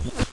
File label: spit